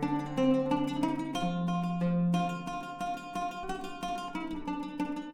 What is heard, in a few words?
Oud 90 bpm D